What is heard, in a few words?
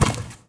basketball ball bounce outside; sporting goods; nova sound hoops; sporting goods master collection